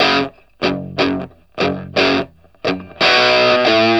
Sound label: Vintage Blues Guitar, 60, FS; USB Soundscan vol.22; AKAI